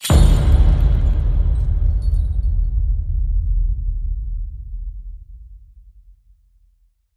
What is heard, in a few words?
Sled Landing Hit; Santa